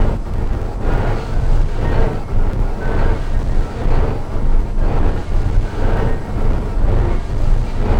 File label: Drone Ambient; Drums & Drones